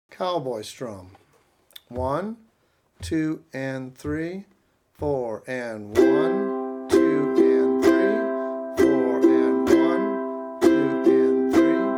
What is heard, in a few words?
Cowboy Strum